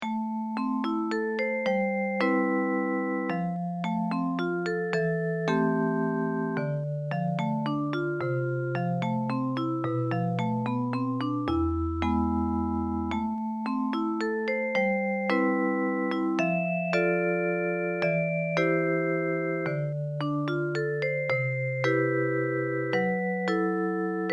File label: elegant waltz